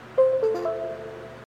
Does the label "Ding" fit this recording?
Yes